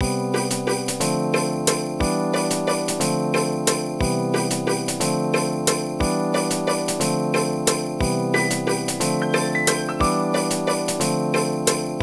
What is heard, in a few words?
ice theme